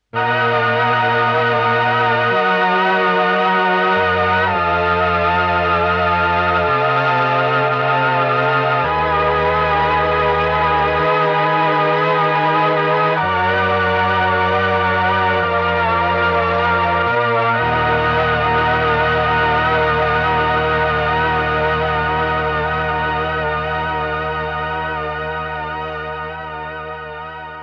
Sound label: T REX Replicator Module Strings Old Tape